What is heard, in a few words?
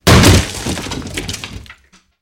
Body Falls On Debris